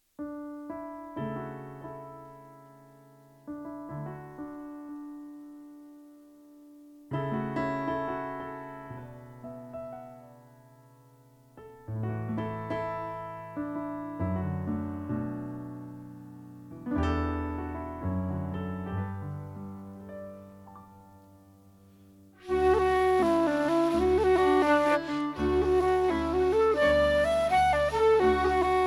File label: Jigs; Rolling Waves; John McHughes; Boys of Tandragee